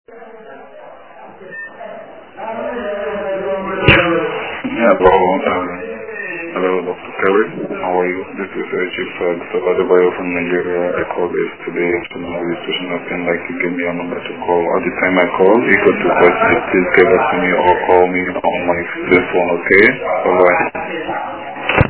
Yawn

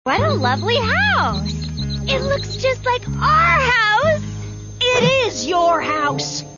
house

